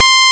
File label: kik horn, Amiga Soundtracker Sample; ST-XX Amiga Soundtracker Sample Packs